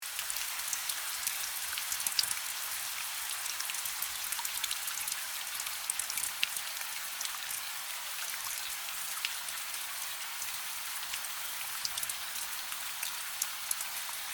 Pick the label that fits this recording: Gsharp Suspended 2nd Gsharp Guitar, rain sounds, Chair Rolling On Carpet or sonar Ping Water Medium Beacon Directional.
rain sounds